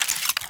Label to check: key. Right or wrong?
Wrong